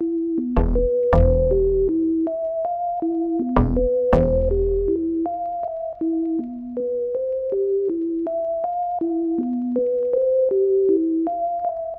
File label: Melody Loop 160 BPM E Min, Hip Hop; Melodics; Melody Loops; Cymatics; Cobra Hip Hop Sample Pack